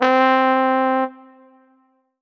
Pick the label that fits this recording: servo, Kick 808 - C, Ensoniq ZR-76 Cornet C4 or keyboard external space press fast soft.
Ensoniq ZR-76 Cornet C4